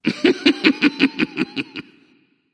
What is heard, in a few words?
heh heh; smite; voicepack